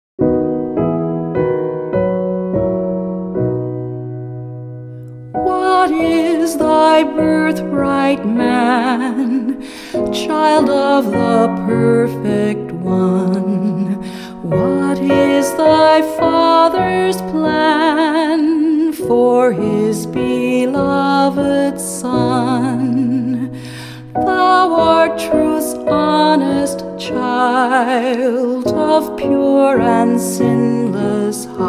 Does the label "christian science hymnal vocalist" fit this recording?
Yes